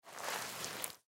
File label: Towel Wet Pick Up, Towel Wet Pick Up Floor; Bathroom; Household; Blastwave